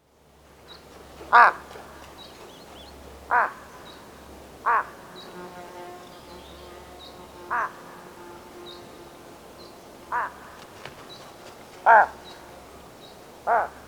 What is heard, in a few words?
Large billed Crow Corvus macrorhynchos